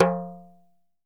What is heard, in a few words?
Talking Drum - F; percussion; Cymatics - Infinity Beta Pack 2.0; Zeus Percussion Collection